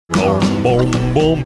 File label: singing bom bom bom